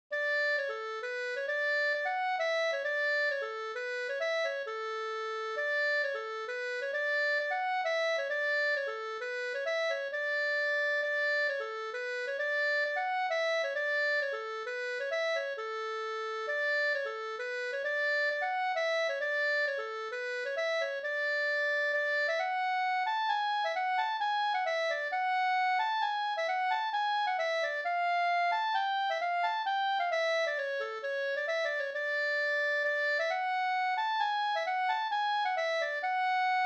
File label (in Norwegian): pols; Pols hass Karl Jan no